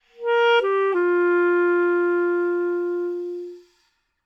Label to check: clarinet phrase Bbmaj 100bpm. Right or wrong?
Right